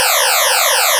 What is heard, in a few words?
ripple